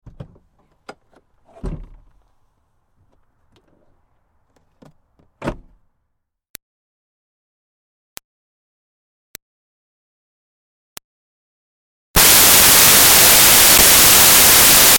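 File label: Car Smart Car Trunk Open-Close Light; 2005 Smartcar Fortwo; Vehicles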